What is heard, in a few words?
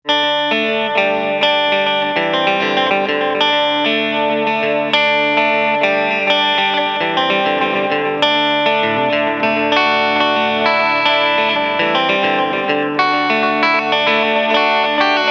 pop; sdd-3000